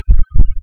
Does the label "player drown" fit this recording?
Yes